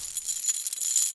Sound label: pkm belt out, firearms weapons